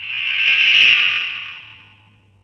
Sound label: Whoosh; SSV-Music